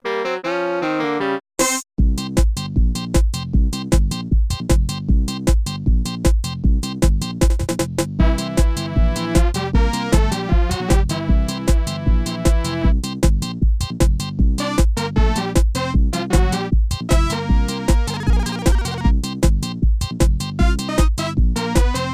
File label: Ska; animal crossing full gamerip